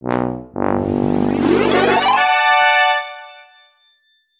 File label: quest complete